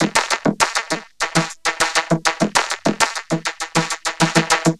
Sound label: Vocobeat; Sonic Foundry (Sony Creative Software)